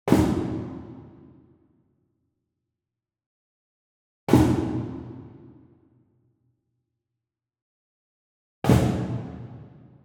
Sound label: Wood Percussion Cajon Flam Coconut Beater Far; boom library; Cinematic Strikes Construction Kit